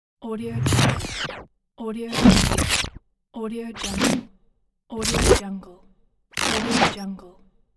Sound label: glitch swipe swish transition